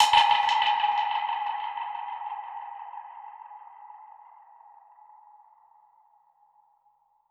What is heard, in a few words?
Perc Hit, dub percussion; musicradar